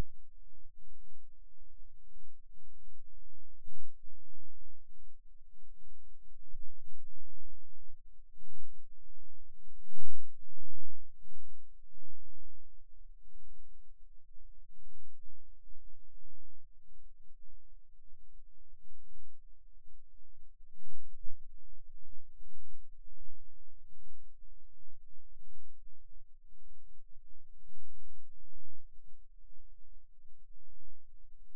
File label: Rhythm Wave, eeg seizures